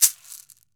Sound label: CAXY, CAXIXI; Brazil